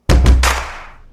transition; Bojack

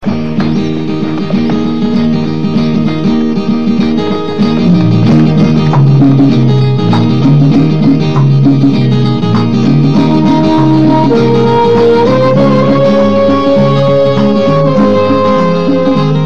Mouth Organ; Sholay

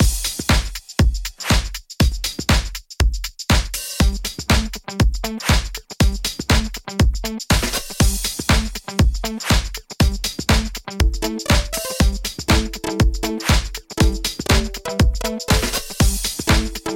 Alien disco

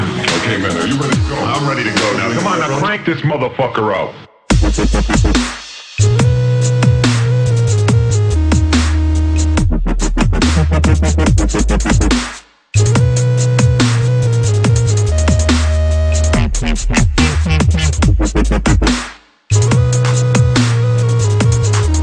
Dubstep

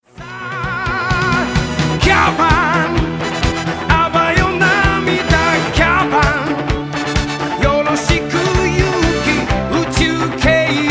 Theme Chorus; Gaban

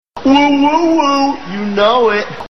Woo Woo Woo

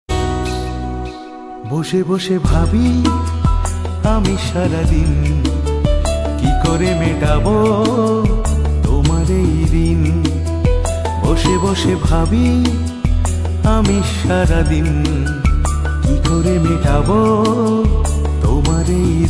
Bengali Melody

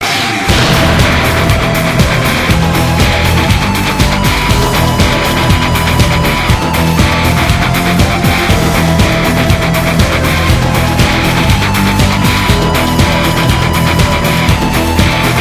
black symphonic metal

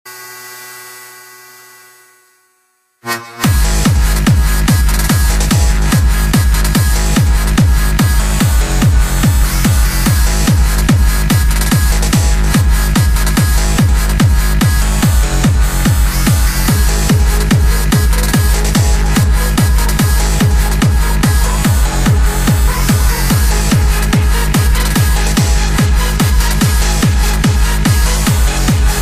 Hardstyle

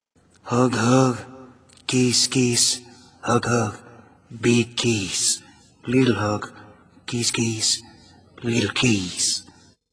Hug Kiss

